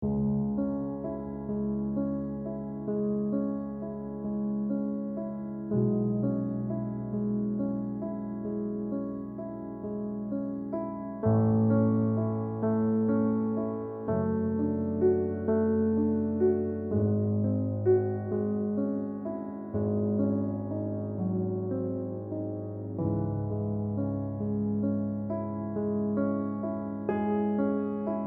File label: Piano Sonata No. 14 in C-Sharp Minor Op. 27 No. 2; Moonlight